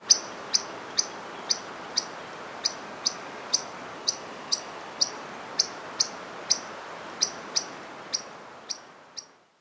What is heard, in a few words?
Yellow-pine Chipmunk